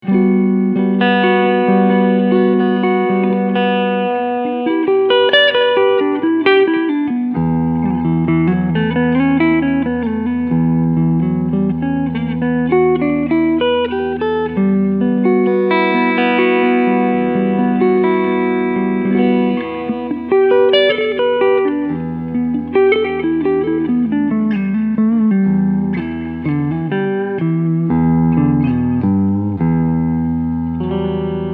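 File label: Clean Front Humbucker, guitar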